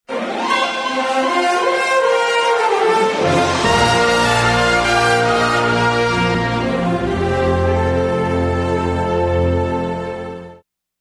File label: Evening News Open; CBS 1991